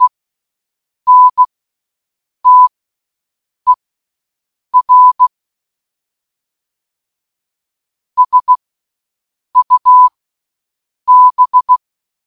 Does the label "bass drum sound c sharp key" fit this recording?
No